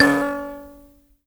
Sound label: HIBZ KL C#4, HIBZ KALI; Heart of Africa